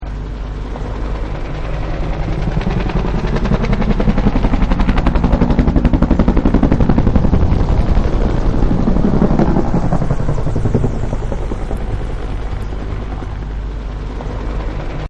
Helicopter Huey Flyby; Helicopters; Vehicles; Helicoptor Huey Flyby

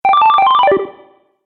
Science fiction Activate Sound

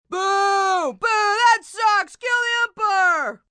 Boo Kill Umpire, Booing, female, baseball; VoiceBox

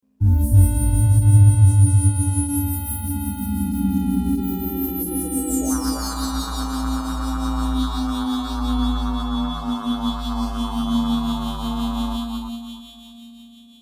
Spaceship Landing, Descending spaceship; Spacecrafts

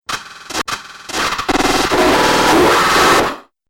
Droid Rust Bucket, robot sound effects

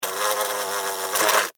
Hornet, Land, Fly, Wings, Buzz, Insect, Bug; Blastwave; Animals:Insects

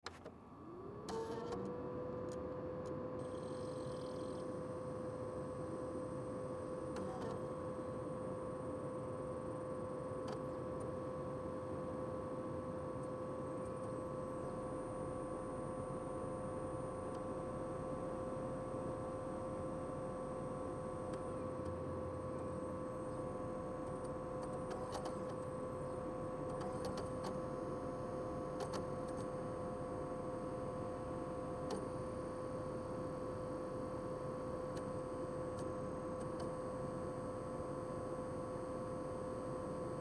Computer Turn On Warm Up Dell; Office; Computers; Electronics; Blastwave

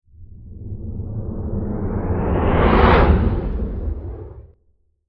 Whoosh Sweep Eerie Low Jet By Single Long Slow; Sound Design; TitleFX; Whoosh Sound Effects

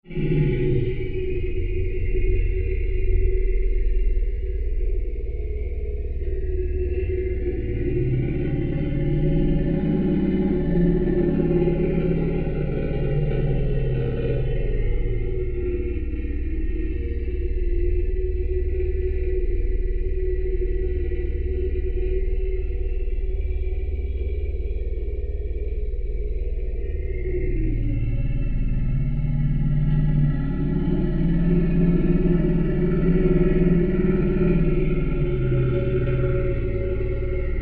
Drone Vocal Guttural Creature Monster Atmosphere; Scary Soundscape; Horror; Scary Soundscapes

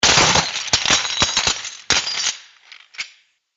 Stone And Metal Debris; Miscellaneous Impact Sound Effects

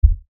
LFE Hit, Lfe Hit Low Bass Impact; Blastwave

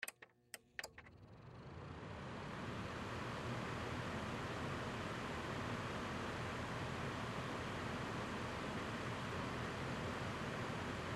Heater Space, Space Heater On Run Off Low; Appliance; Household; Furnaces

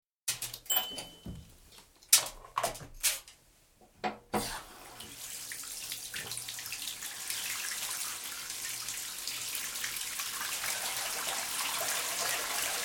Bath filled; Water; Nature; BBC

